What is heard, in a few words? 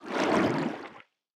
creature sea monkey swim slow